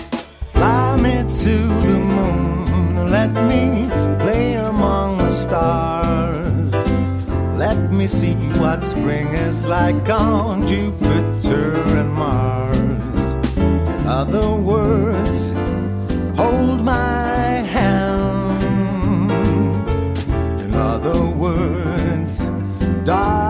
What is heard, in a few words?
Club Band Dinner Music